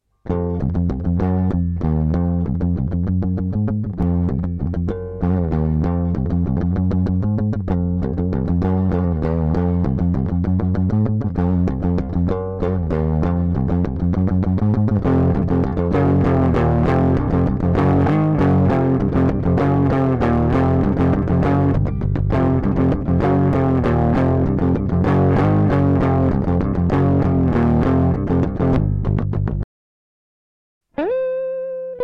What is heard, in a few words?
Unprocessed, guitar; Unprocessed Guitar Sample; AmpliTube 2 [Advanced Guitar Amp and FX Modeling Plug-in]; IK Multimedia